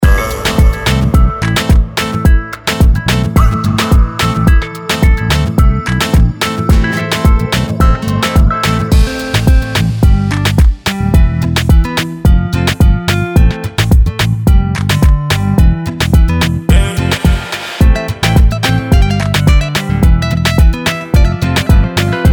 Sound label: latin vibes video trailer soundtrack